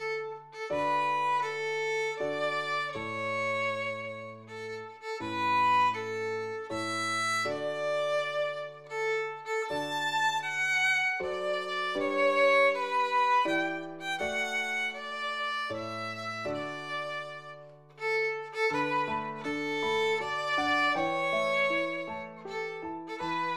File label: Violin; Happy Birthday